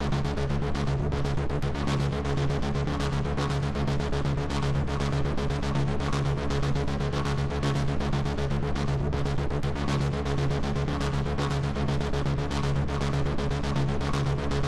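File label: synth loop; transformers war for cybertron gamerip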